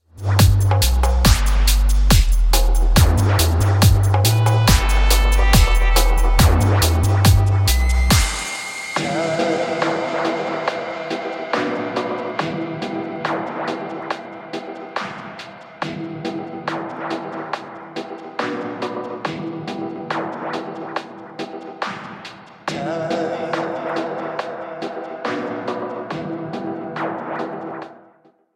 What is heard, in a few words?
machinedrum gunshot dub remix